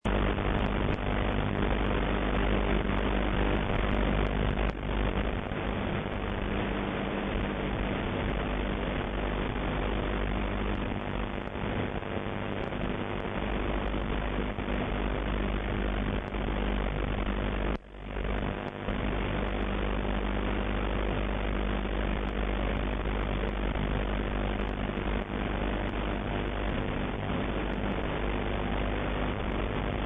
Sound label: noise